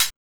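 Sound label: hi-hat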